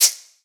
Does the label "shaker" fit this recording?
Yes